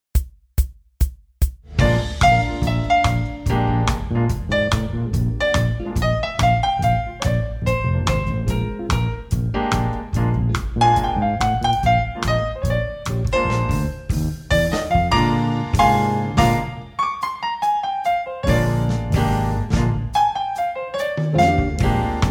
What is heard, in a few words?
piano i chord rock; transcribing solos